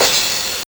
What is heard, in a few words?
ChipShop GB Crash, cymbals; ChipShop Deluxe; ChipShop Hits